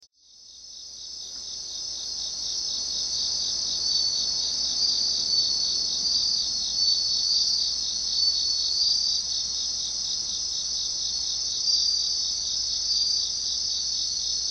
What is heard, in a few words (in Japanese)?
suzumusi